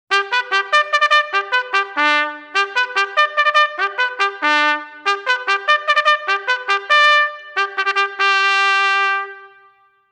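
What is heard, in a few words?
Police Call, bugle call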